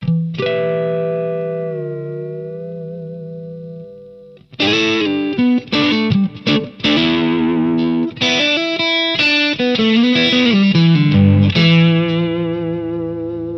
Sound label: Platinum Neck, guitar pickups